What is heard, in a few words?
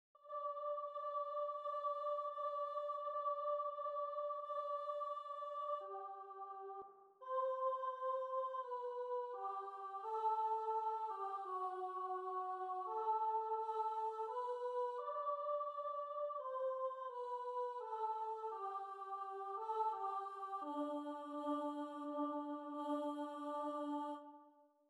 choir